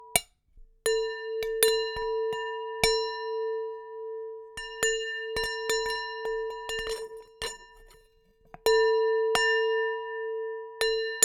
Thin Stick Montage Hits, Large Wine Glass

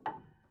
Prepared Piano

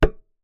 Key Noise, Melodica

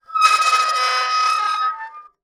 Soldering Iron Stand Drag

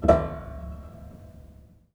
String Rub Erratic, Piano; Piano Miscellaneous

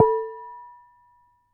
Damped Hit, Glass, Large Wine Glass

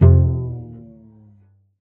Cello D Bass

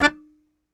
Very Short Note, Melodica

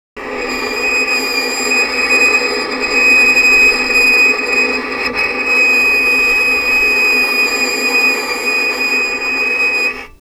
Violin Extended Technique

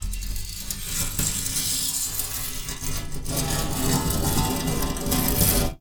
Wire Brush Glass

